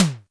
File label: Roland U110 Elec Drums B1